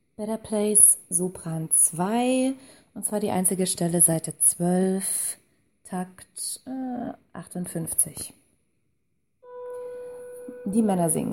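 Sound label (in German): Better place sopran, gospel choir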